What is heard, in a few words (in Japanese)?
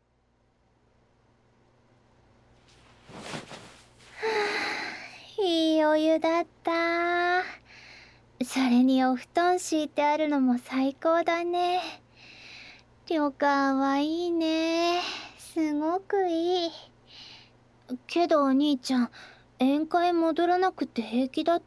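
湯上がり 耳かき（右耳）; ASMR; 湯上がり耳かきは伝説の『あすなろシルバー』で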